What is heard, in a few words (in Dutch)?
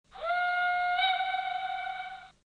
fluitsignaal vertrek heen